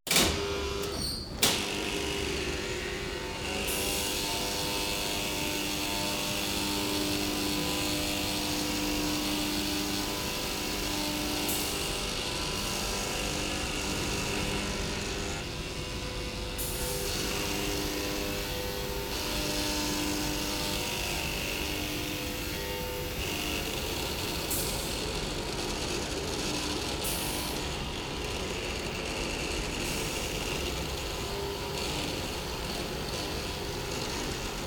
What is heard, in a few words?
Crane inside hanger; Industry & Commerce